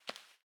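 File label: footstep concrete; The Swapper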